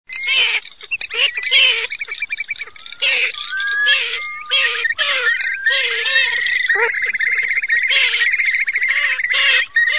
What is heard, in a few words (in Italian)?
MARZAIOLA CHIURLO